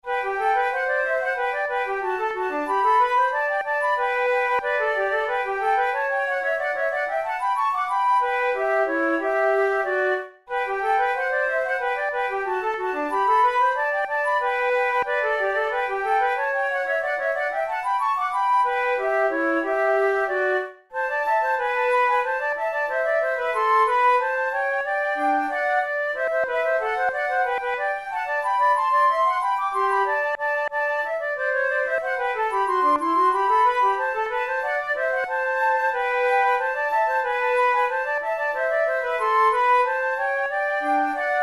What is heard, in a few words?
pour la flute duos allegro; devienne; nouvelle methode